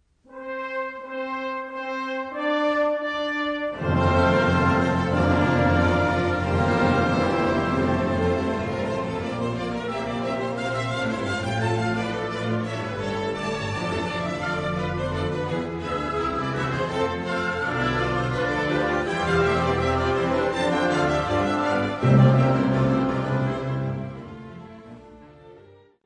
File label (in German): Orchestervorspiel; Erntefeier